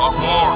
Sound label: car horn